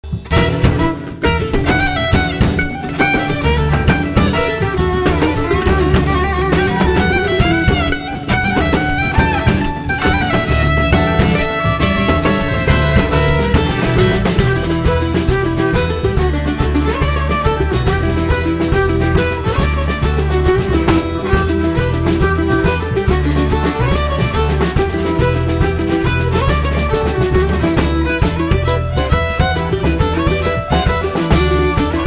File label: Swallowtail Jig Rights of Man Hornpipe